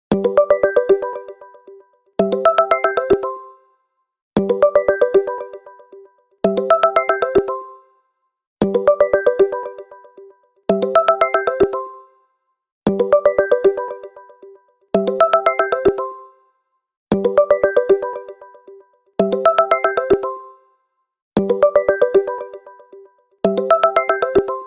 google duo group call ringtone

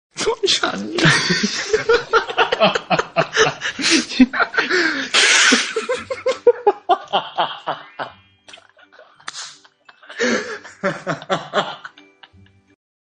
laughing ringtone; android

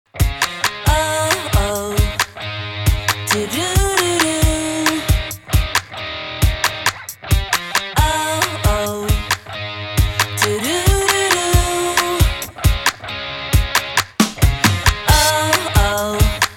campus life ringtone

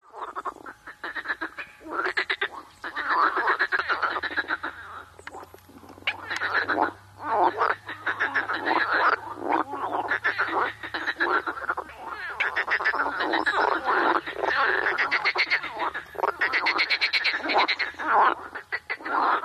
frogs ringtone

